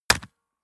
gun shot silencer wrist; schusswaffen

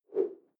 whoosh simple short tonal

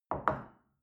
shell place on table soft, gastronomie; berufe; soundpool

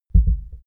heart beat double fast

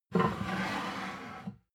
plate stack slide table, gastronomie; soundpool

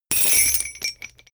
metal chain drop hard

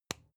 spice jar smack close soft; industrie wirtschaft; gastronomie

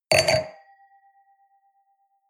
wine glass place table hard, gastronomie; soundpool

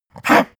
pug gag short; natur-tiere; hunde

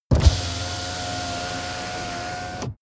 car window back open full

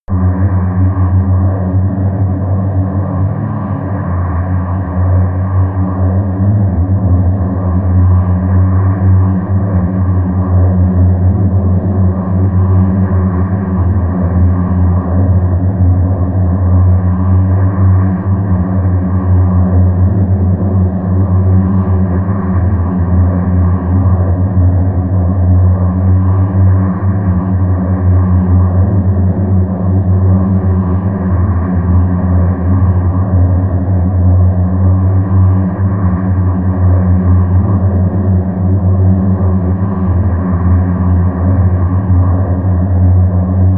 drone stealth high

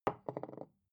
glass put down small wobble; objekte

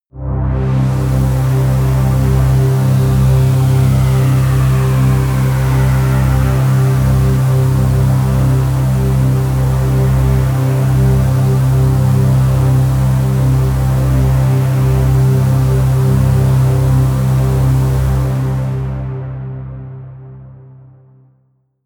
pad sweep wind; flaechen

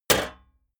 sheet clank damped

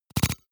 noise metal scratch splutter; maschinen; signale; soundpool

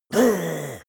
leprechaun gasp; koerpergeraeusche; comedy-cartoon; soundpool

